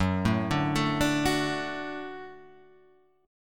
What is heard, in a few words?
F sharp Minor Sharp 5th F sharp; arpeggiated; JGuitar